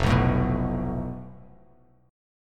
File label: F Major Flat 5th, Bass Standard; FMb5 strummed; JGuitar